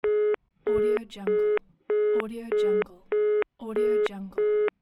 phone call disconnect

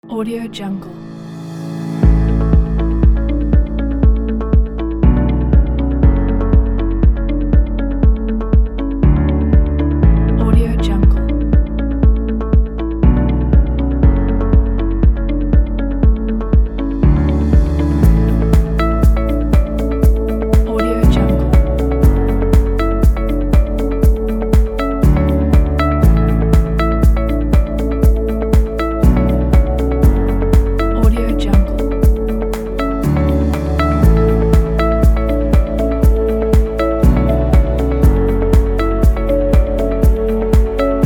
soft inspiring corporate

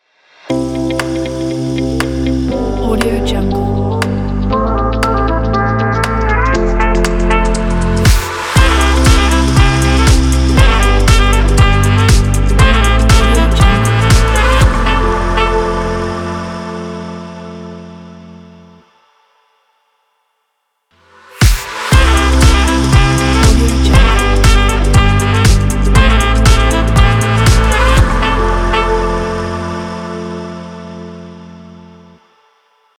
positive podcast ident opener

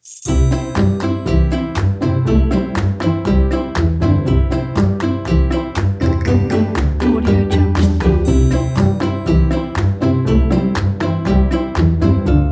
happy fun comedy

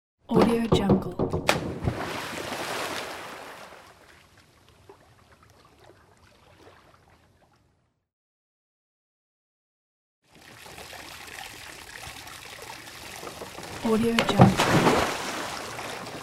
jump in water

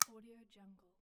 plastic light switch; stormwaveaudio

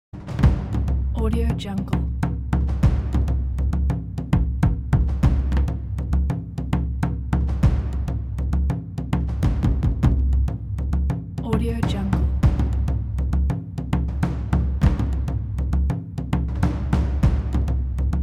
tribal motivational drums

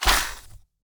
web release wet crunchy whipping swish

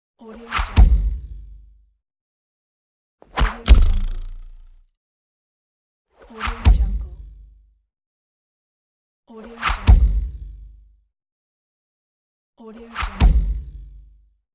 knife flying and impact

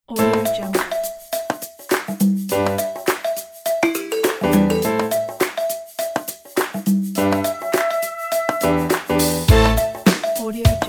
funky brass groove; alfonseproductions

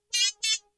robot vocal synth like high pitched whiney

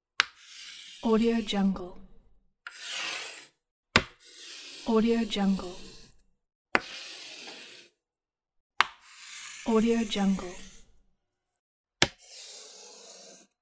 chalk drawing lines; audio-therapy

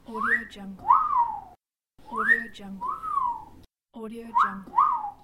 wolf whistle 3 variations

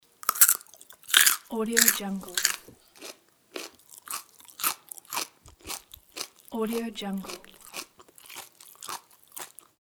eating carrot

